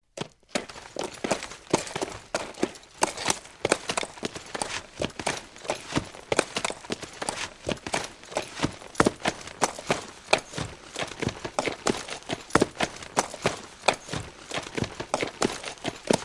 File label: Horses Slow Steady